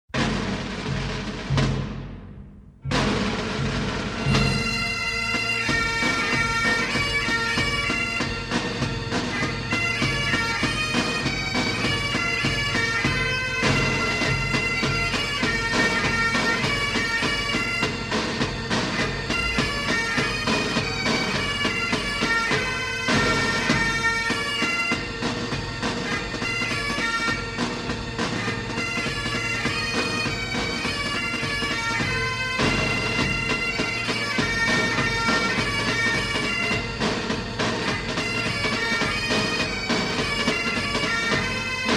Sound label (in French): Cornemuse - Hymne écossais; version courte